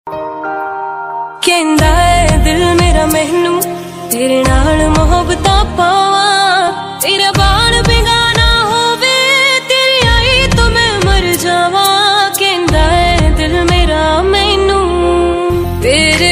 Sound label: romantic ringtone, bollywood ringtones